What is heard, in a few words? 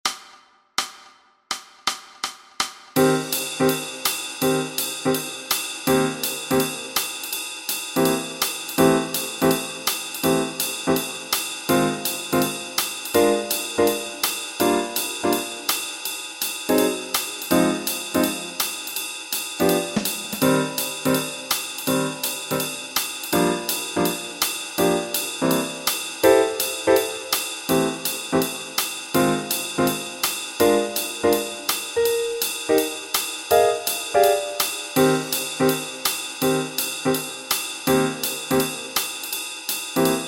Backing Track, walking bass